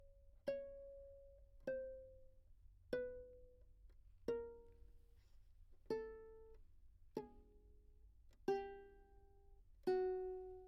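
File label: tenor viol back microphone pizz chromatic descending, Anechoic; OPENAIR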